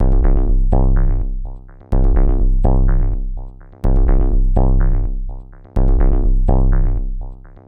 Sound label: Bass Loop; Shinechilla